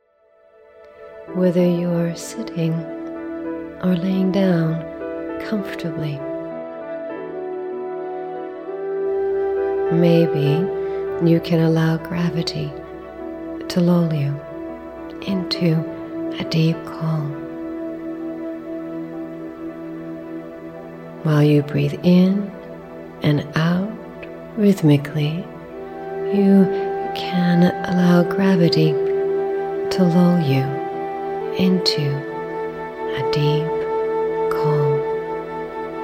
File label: Breathing